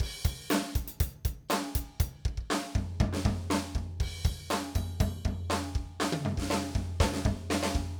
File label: DRUMLOOP